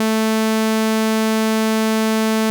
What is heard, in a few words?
tone